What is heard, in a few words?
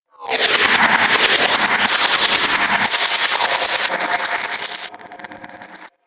Shaky Laser; Laser Sound Sound Effects; Andrew Fleming